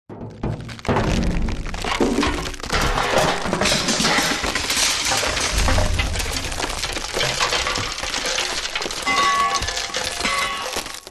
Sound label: impacts metal wood crash collapse drop stress; zapsplat